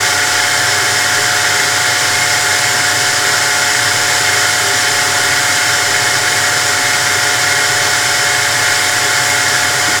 ambient; Sci Fi Ship; SciFiShipController

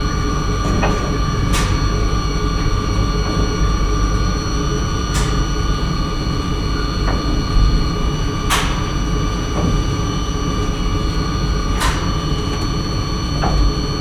elevator, Sci Fi Ship; SciFiShipController

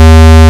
deny, Sci Fi Ship Controller

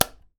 switch off; BNG Framework

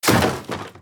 destroy; hellbound

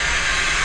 ssc door 2w, SciFi Ship Controller Sounds

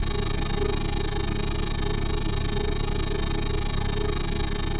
Speed loop, Shuttle

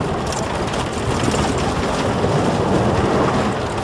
fast, RollerCoaster; Dreamteck; Splines

